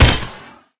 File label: kappa hit; smite; kuzenbo